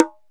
BONGO LO OP; Akai MPC3000

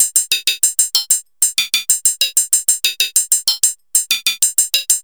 LOOP, DRUMS & PERC

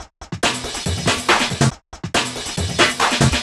LoFi Beats, 140 BPM

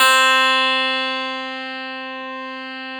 Clavinet C2; Classical Prepared Pianos; Total Piano

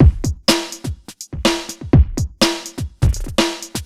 Megagroove, Drums Beats

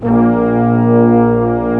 BRASS 1 A2 -R; AKAI S6000 CD-ROM - Ultimate

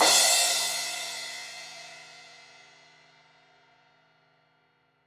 Crash 16in edge, Cymbals; Deeply Sampled Drums; Cymbals Stereo

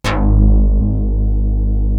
MONSTER BASS; East West; Global Sounds; DIGIBASS

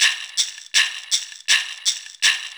LOOP

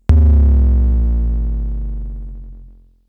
KLONE BASS MOD, Klone Dual-Percussion-Synthesiser; Drum Machines

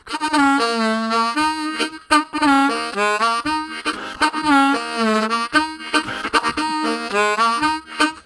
Low Chat, Harmonica Riffs; Zero G

